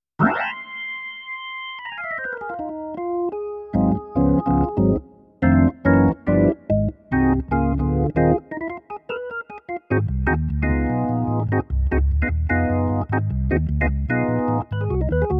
jazz organ swing

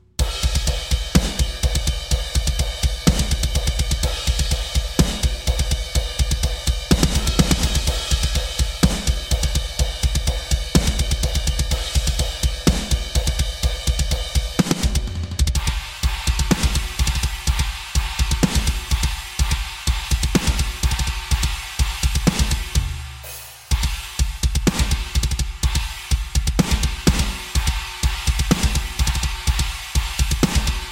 pop drums on

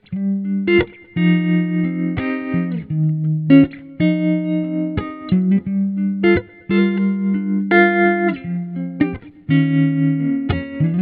riff 87bpm

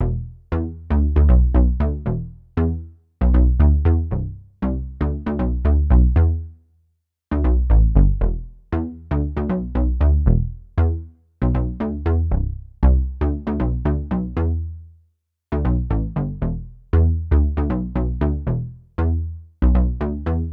bass

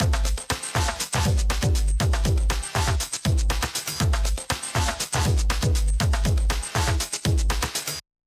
beat; dieroboter

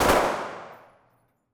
assault rifle gun shots

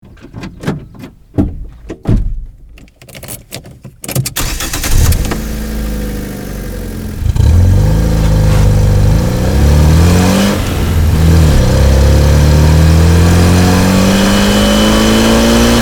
fiat panda vehicle

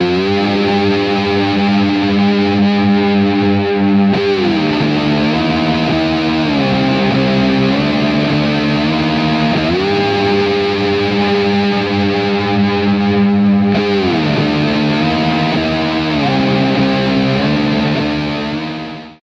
through zero chorus flange

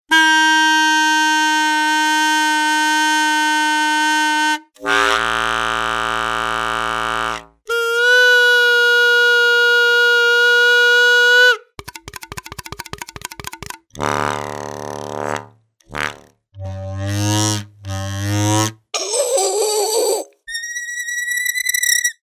sfx

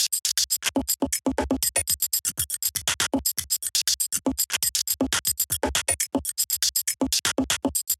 machine tribes loop

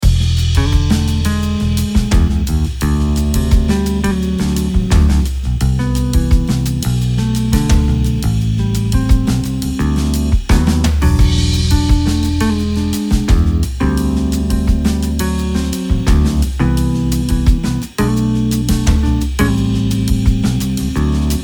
vintage pick bass

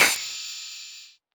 gritty ride hat

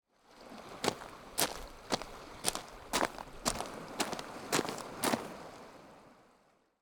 steps coarse gravel tennis; audiosteps

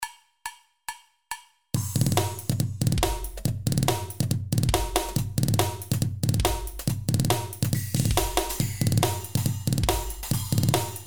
taldrum icebergdrums jungle

